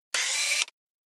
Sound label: Camera Lens